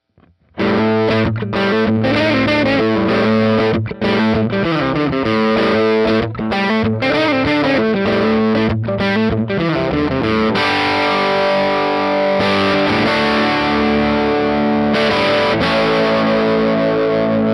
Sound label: 6l6 dirty